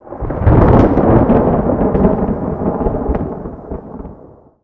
temporale, natura

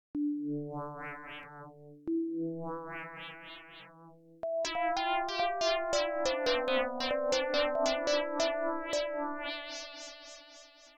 Mistero

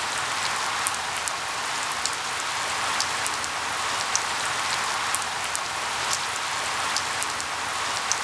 Pioggia, natura

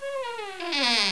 porta apertura

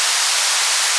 Vapore

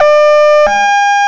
sirena

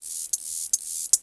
Insetto, animali

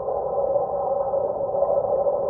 Vento, natura

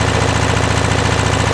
Veicolo, motori

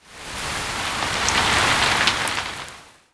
Acqua, natura

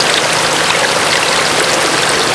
Veicolo su acqua, motori

